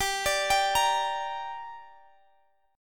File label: G Minor, Guitar Standard; arpeggiated; capo 2; x,x,17,19,20,18